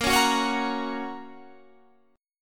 A sharp Minor Sharp 5th, Guitar Standard; 18,16,16,18,19,18; strummed; JGuitar

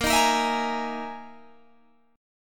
E Major 7th Flat 5th chord

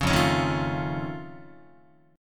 F Augmented 7th F Guitar, chord; F+7 strummed; 4,1,4,1,1,4; JGuitar

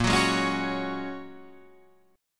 A sharp Minor 7th Sharp 5th A sharp

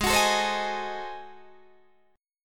Ab 7th Flat 9th Guitar Open D, chord

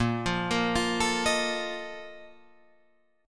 Bb Major 7th Suspended 4th, Guitar, Drop D

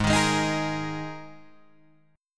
Ab Suspended 2nd Guitar Drop D

